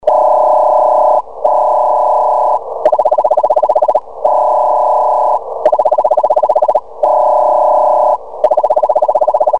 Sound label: clover, funk